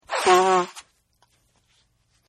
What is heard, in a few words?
Nose Blow Honk; Sickness Sounds; Partners In Rhyme